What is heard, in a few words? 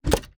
ui corridor construction plan place